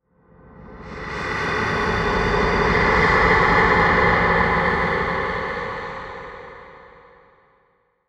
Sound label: SWELL GHOSTLY, Swell Ghostly Airy; Production Element Imaging Element Accent Transition